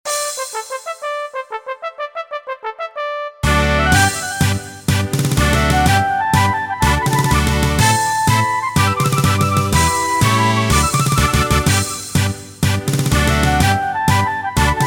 фанф минус ФАНФАРЫ

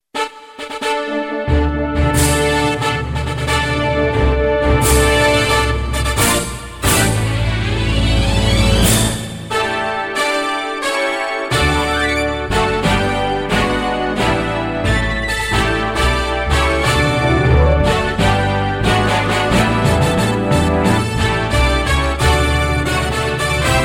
фанфары ф-но

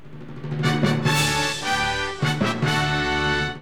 Фанфары

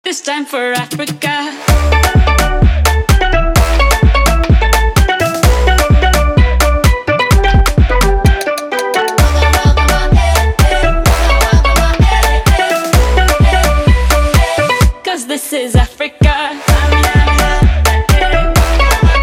Отбивка

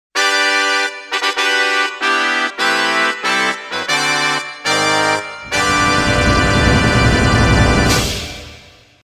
Фанф торж

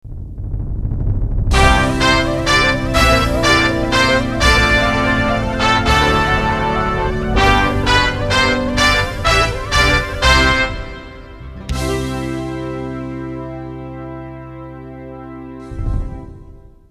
ФАНФ Школьные; фанфары

